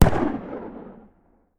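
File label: shotgun exterior shot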